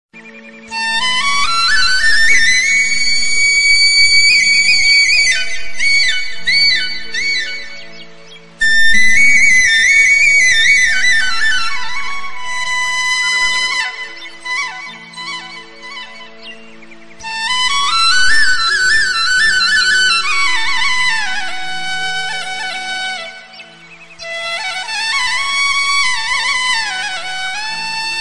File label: Morning Wake Up Flute